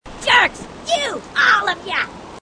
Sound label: grunt; h3dialogue; grunts